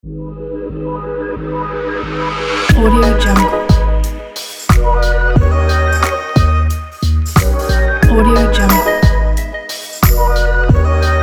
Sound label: stylish blog podcast ident